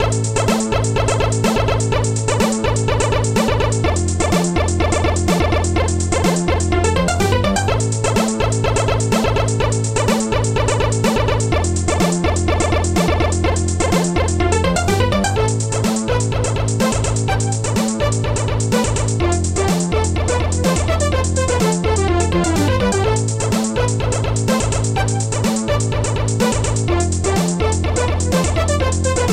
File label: blast